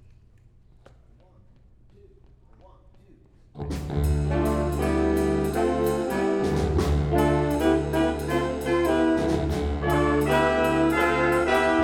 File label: jazz band; Upper School Jazz Band